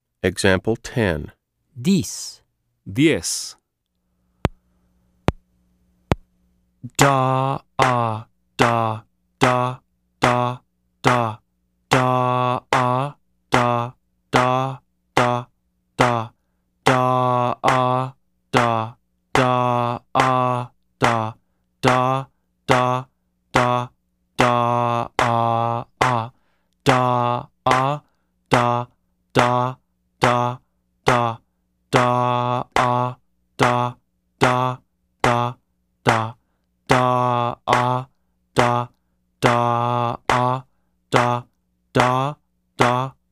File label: Keyboard